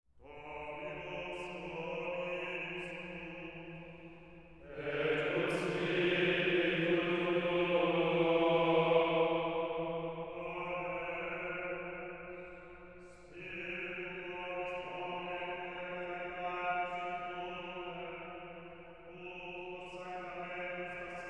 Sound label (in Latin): Postcommunio chant; Dominus vobiscum; Oremus Spiritus nobis, Domine